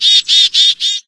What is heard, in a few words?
single bird; ambient; random animals; Left 4 Dead 2; Source Engine